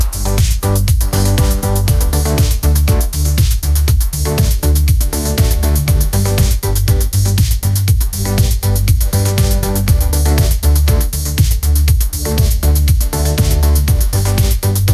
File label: upbeat pop melody, ringtones